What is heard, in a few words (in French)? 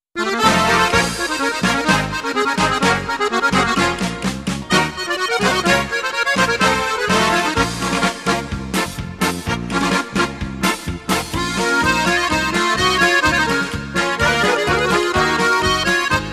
Paso du Bal Musette